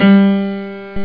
Piano G2; Instrmnt; Sound Library 2000; Wayzata Technology

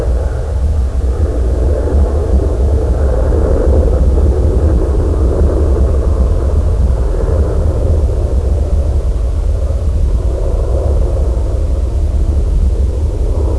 jet

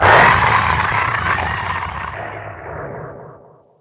sacrifice; DAoC

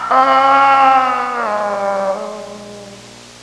groan; People; Sound Library 2000; Wayzata Technology

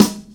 snare; crakbone

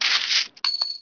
purchase; vampire demo

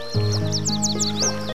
Frogs; FreakyFrogs System Exclamation

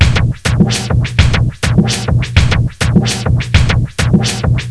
beat sol

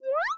expand; SideSlide

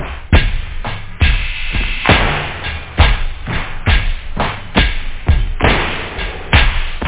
beat, house loop; da capo vol1

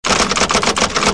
typewriter

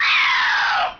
BANSHEE

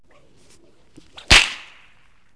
whip

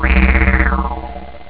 juno, synth bass

